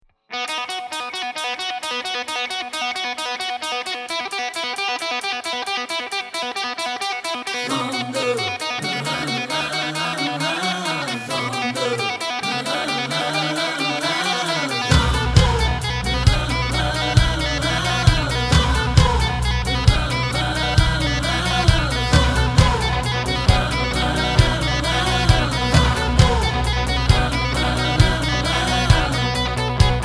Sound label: backing